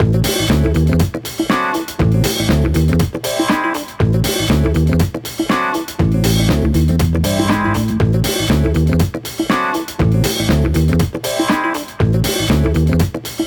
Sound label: Funk beat; Falueb Laynolat